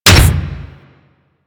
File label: Step Metal; Warframe; machines; Jackal